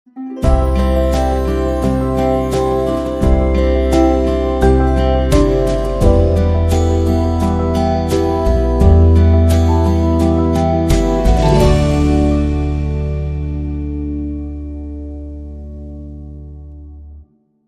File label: Sting